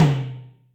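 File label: Industrial Tom, Yamaha DTXpress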